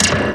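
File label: percussion FX, Dance Drums; Best Service; Dance Mega Drums CD2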